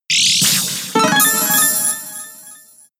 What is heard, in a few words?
In-Battle Held Item Activate; pokemon sfx gen 6 attack moves xy oras